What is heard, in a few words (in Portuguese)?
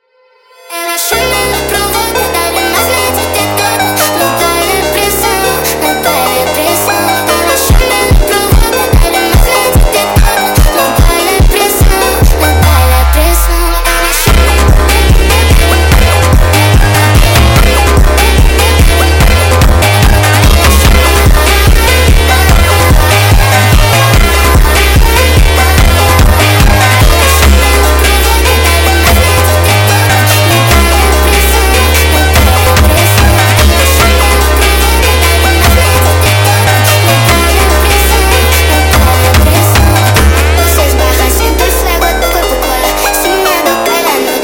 sped Up, phonk